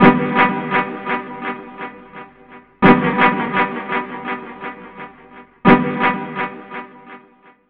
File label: 170 G, Guitars, Drum & Bass; Total Drum&Bass; 90 s Sample CDs